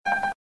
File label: Quick Piano